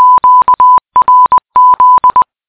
Qrz Morse Code

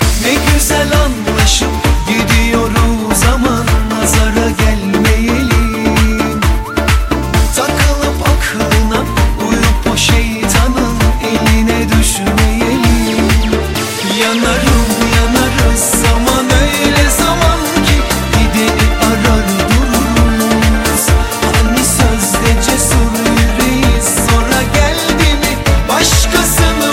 Turkish Hit

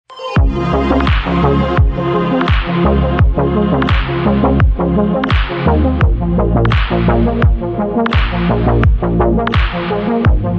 Chillwave

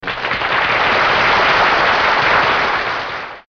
Clapping

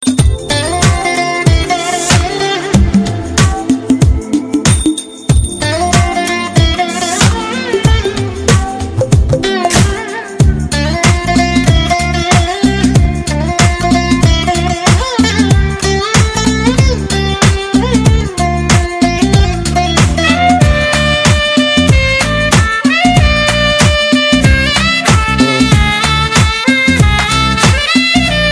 Bgm; Dil Se

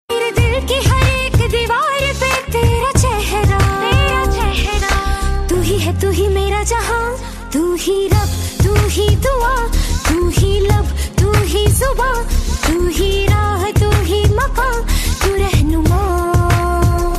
Female

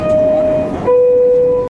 NYC Metro Door Chime

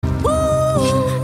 Woooo hoooo sms

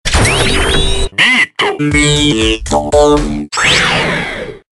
Beat Switch

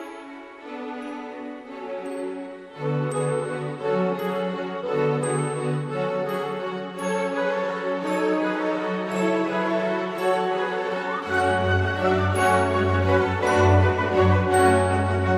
Ukrainian Bell Carol

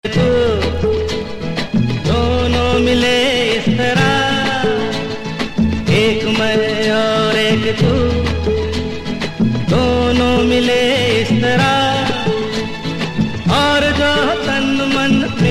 Old Classic

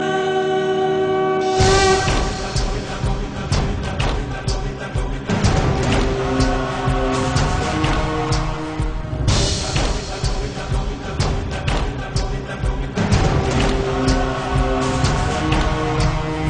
Trance

